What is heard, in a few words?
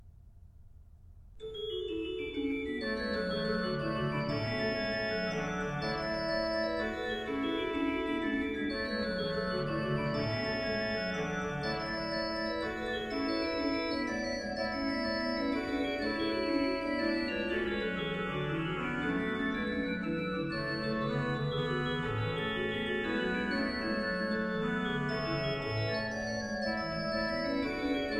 In dulci jubilo, organ, chorale preludes; BACH; Orgelbüchlein